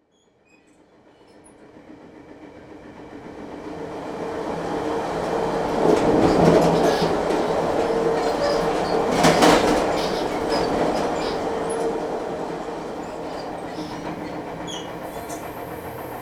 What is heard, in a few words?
train sounds